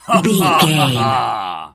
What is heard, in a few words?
voice male laugh short